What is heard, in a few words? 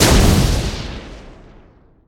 bomb explosion; guodongrenwu